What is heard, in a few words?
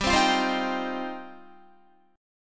G 7th Suspended 4th Sharp 5th, Guitar